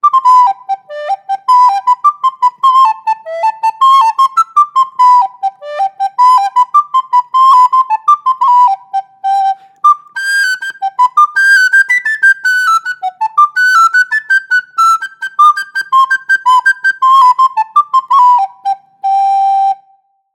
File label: Mollenhauer 1042d Student Soprano Recorder